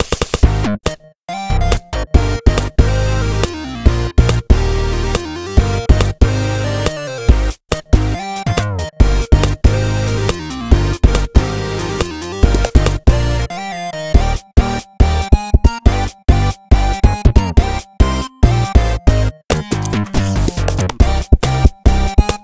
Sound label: Future Phone, ringtones; Samsung; Galaxy M20